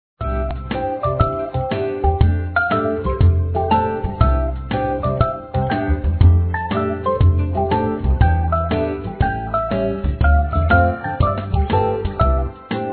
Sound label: Starters BGM; Samsung 2008; TV